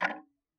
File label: Tick, Clocks Kitchen Clock